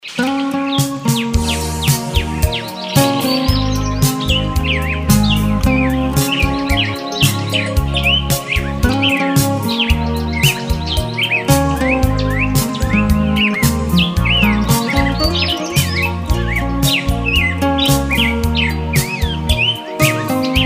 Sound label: Romantic Date in Garden